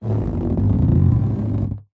balloon inflated handle creak muffled; zapsplat

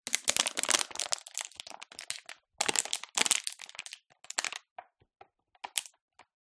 plastic bottle empty crush

